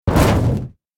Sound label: bulk metal hit; Smite; Cabrakan